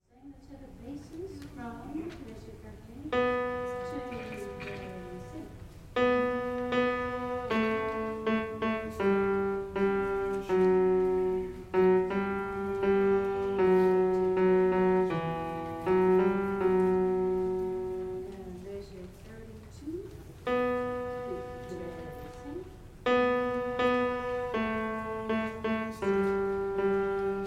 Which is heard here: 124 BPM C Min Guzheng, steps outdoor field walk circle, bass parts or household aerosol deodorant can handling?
bass parts